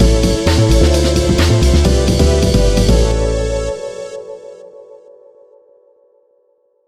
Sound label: Victory Theme